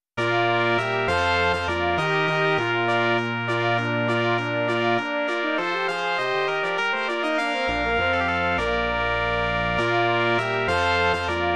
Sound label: Brass Quintet; Mentre il cuculo